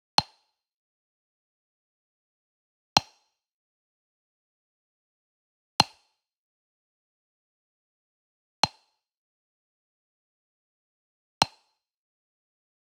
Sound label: WOOD PERCUSSION Wood Block Traditional Small Hit Near; boom library; Cinematic Strikes Construction Kit